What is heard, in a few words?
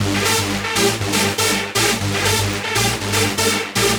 Trance Synth